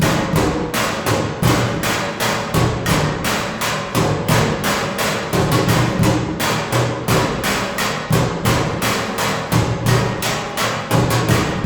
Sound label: Music Exterior Song; Vent Kids; Skeg